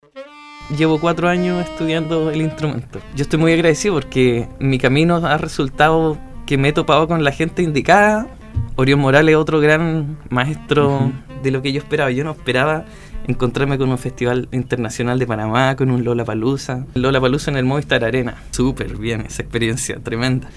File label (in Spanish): SAXOFON